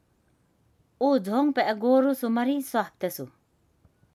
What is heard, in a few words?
beat